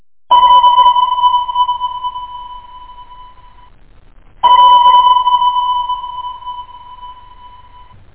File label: ping